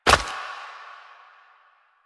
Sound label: Step Flesh; Warframe; Koumei